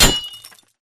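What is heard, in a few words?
Impact Pulse Blade Metal; Titanfall 2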